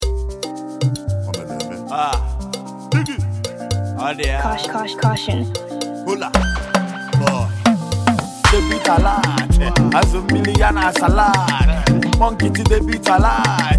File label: Debit Alert Instrumental